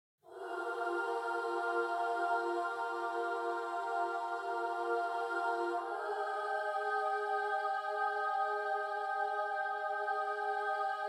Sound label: Choirs; ProScores